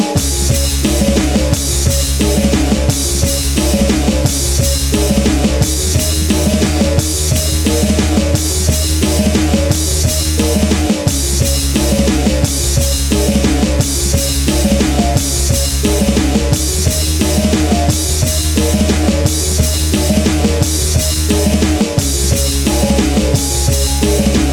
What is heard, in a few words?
Glacier Rock Loop